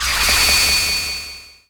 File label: Enemy Work Common Vanish